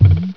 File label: MOVE; HBMonopoly